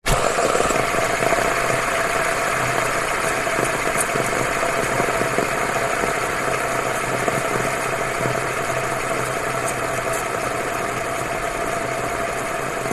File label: leisure toy fidget spinner metal spin on wooden table; zapsplat